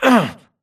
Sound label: grunt hits; Titanfall 2